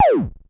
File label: Perc Synth Raw; sci-fi